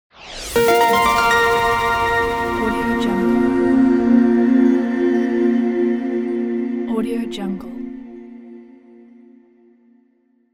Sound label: ambient soundscape logo